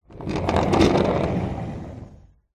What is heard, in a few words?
household door wood patio sliding internal open or close